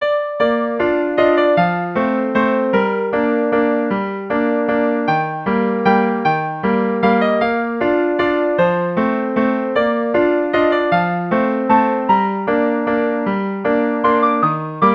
waltzer flat major; vasas judit

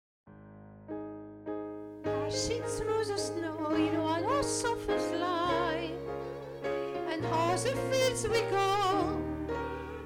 jingle bells; vidal genevia

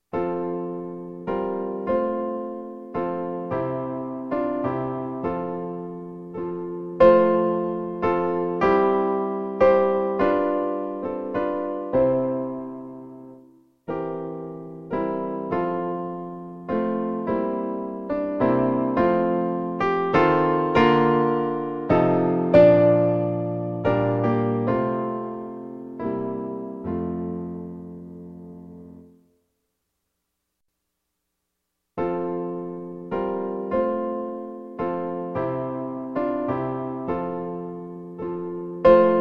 chorale; schulze friedrich august; hymnes et cantiques n 189

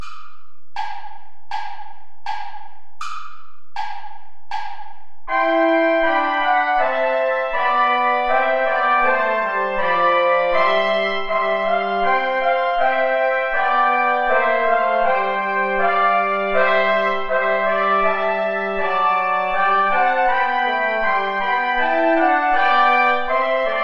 play along without trombone; bach johann sebastian; ach mein herzliebes jesulein

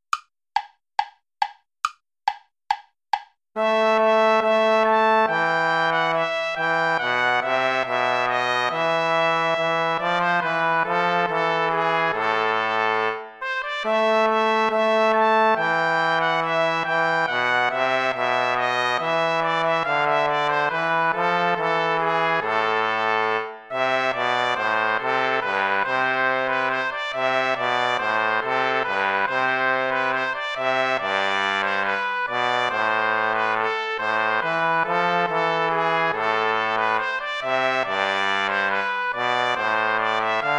allemande play along without trumpet

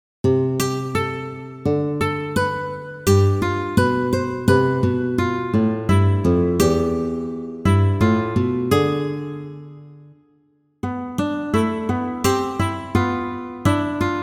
contrapontic study; avec tablature

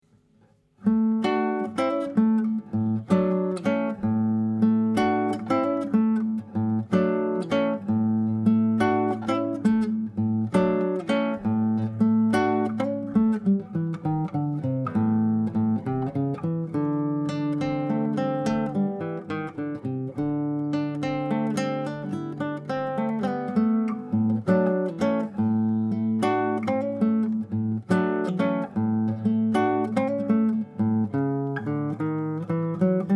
acoustic blues; cevaer yves

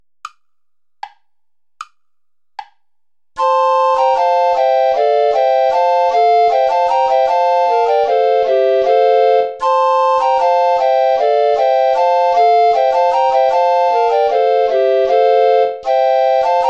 play along without guitar; deck the halls; traditional

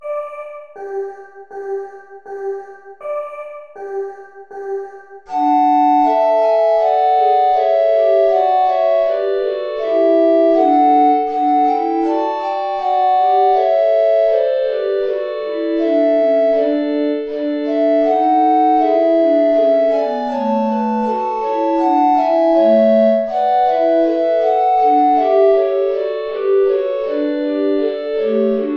play along without soprano recorder; bach johann sebastian; christ est chantons choeur

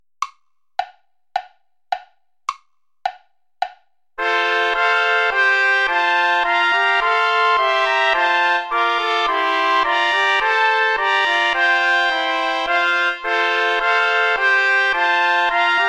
play along without trumpet; bach johann sebastian; was gott tut das ist wohlgetan

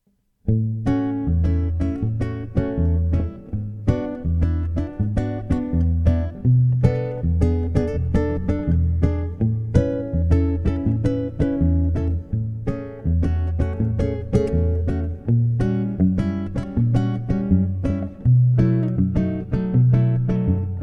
classic bossa

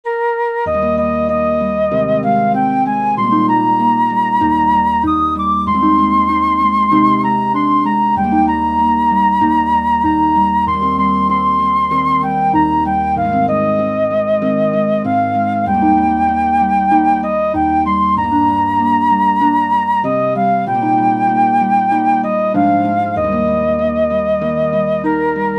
for flute harp

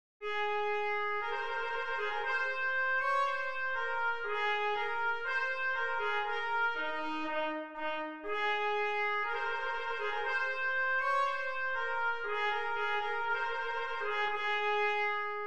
trumpet voluntary; clarke jeremiah

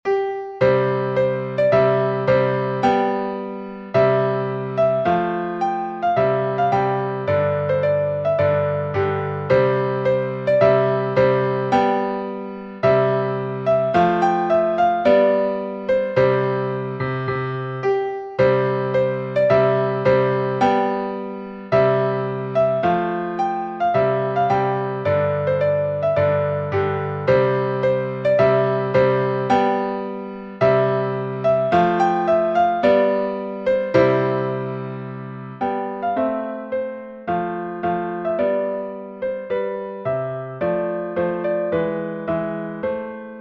prelude; Charpentier Marc-Antoine; eurovision hymn

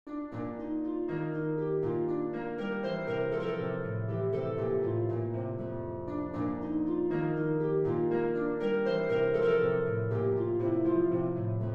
gigue

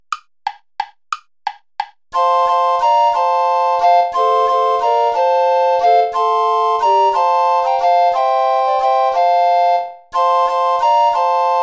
dir ist freude play along without bass recorder; gastoldi giovanni giacomo

